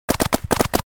Paintball gun automatic burst firing shots; sport

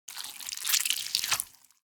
Cartoon accent slime squelching and gurgling

